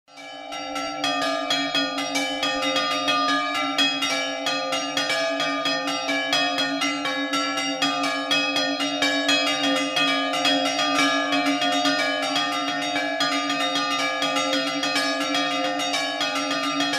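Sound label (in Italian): paradiso scampanottata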